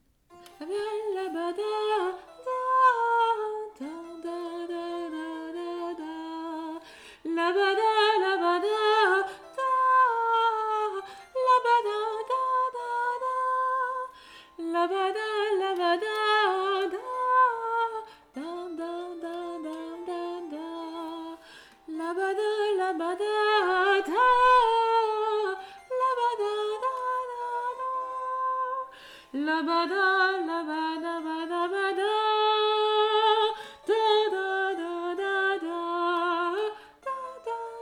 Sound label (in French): Gavotte Alto